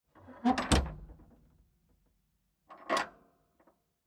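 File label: Old Landrover Closing Tailgate Clipped Metal Hinge Creak; Transport Agriculture; Truck Sound Effects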